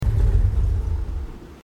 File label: shelby stop; vehicles